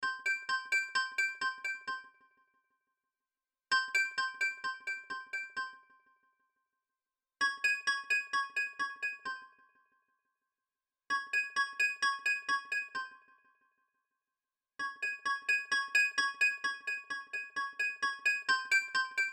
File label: eerie eyrie